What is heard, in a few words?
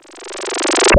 Reverse Fx; musicradar